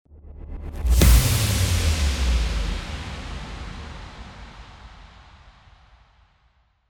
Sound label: IMPACT